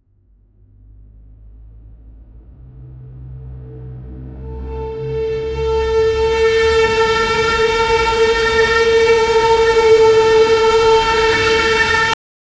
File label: long sirene war, emergency